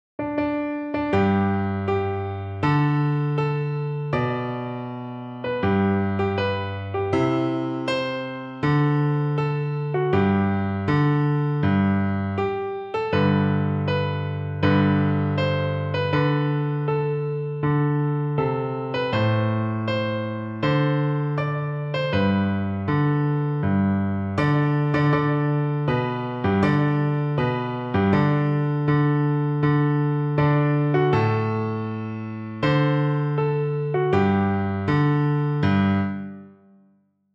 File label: la marseilleise, piano